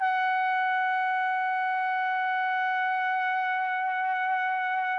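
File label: trumpet; Roland - LA Composer vol. 3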